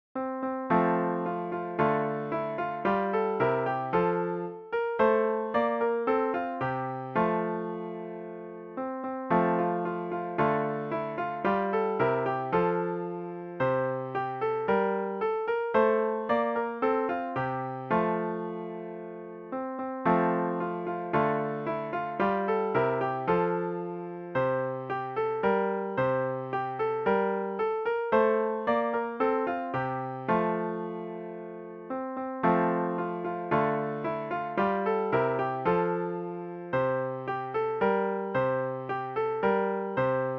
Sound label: twelve days of christmas piano